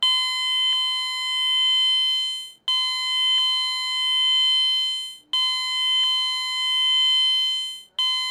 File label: Tone